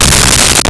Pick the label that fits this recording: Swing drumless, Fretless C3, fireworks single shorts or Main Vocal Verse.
Fretless C3